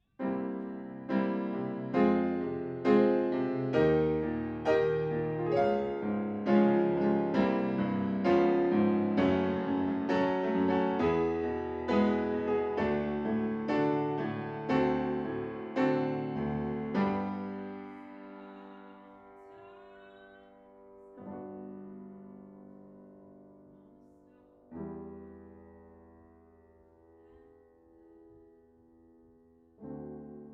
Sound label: Piano Room